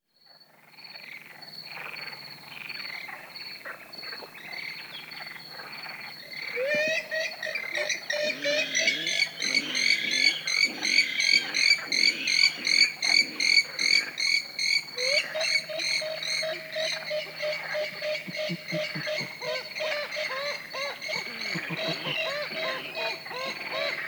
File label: purple swamphen porphyrio porphyrio porphyrio